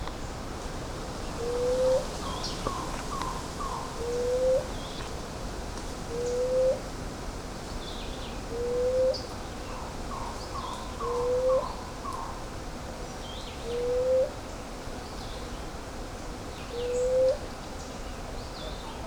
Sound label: Blue headed quail dove; قمری بلدرچینی سرآبی